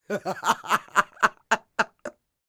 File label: LAUGH